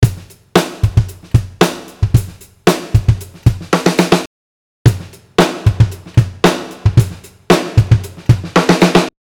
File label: Drum